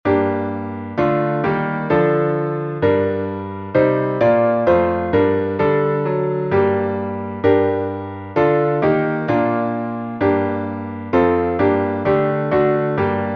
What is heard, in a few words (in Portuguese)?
salmo instrumental